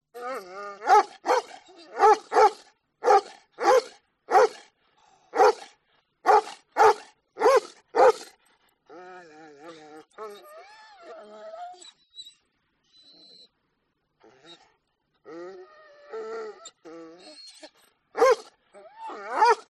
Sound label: Dog; audio hero